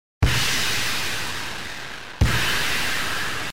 Waves crashing - Produced by using symbols in Garage Band and distorting in premier